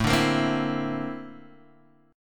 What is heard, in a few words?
A sharp Major Sharp 11th, chord